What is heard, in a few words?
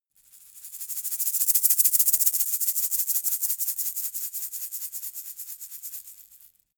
shaker plastic egg rattle dynamic